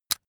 razor rickety fold out hard

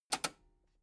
metal button cassette stop release

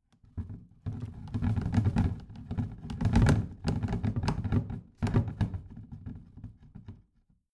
plastic trash can rattle

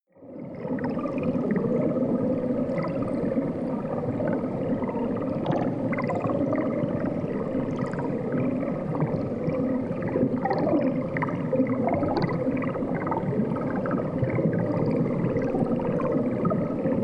water fx spooky

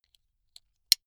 buckle plastic insert unsteady close soft

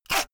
saw use short quick

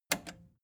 record player repeat click slow

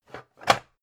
pan stack hard

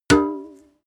paint metal tin hit sing

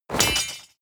drop metal blade small

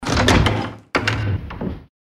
door open close

